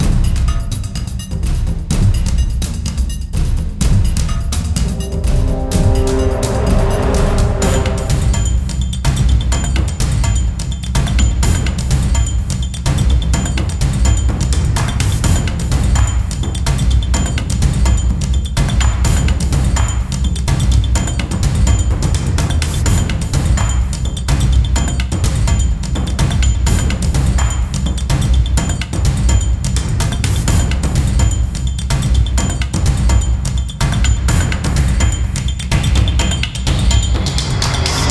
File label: danger funny; Identity V